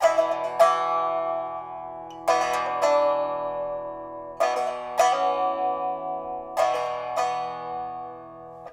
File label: Bm Rythm Pipa Lute, 110